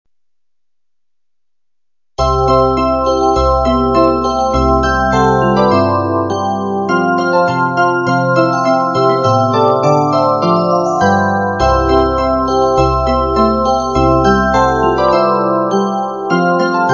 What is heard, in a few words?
Jingle Descant